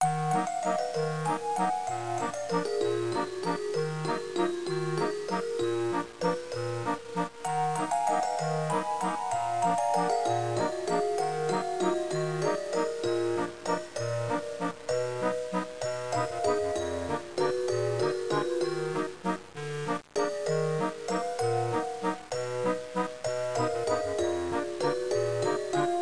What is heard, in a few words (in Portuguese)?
trilha; GEOGRAFIA